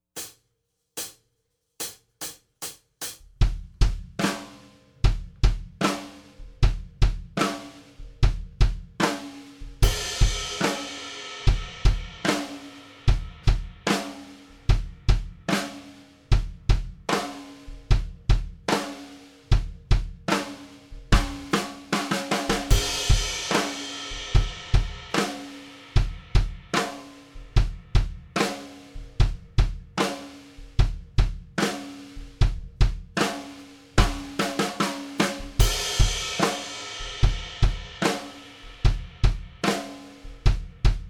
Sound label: Power Rock Rehearsal Track Drums Only